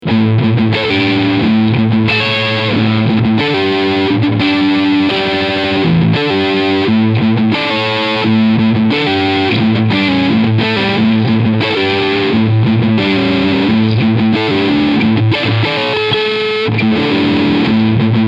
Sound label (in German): ESP LTD M 400 Riff beide PUs